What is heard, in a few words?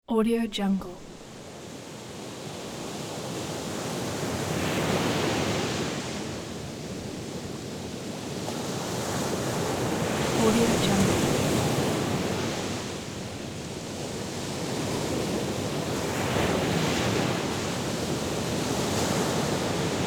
calm ocean